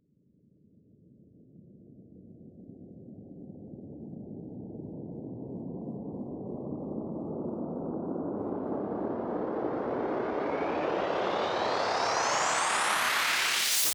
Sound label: 134BPM Sweep Fx; Sound FX; Cmajor